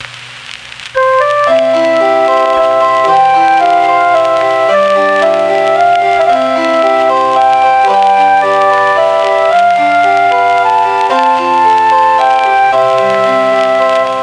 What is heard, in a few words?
phono; NIV Bible, Billy Graham's Steps to Peace with God, WWJD Screensaver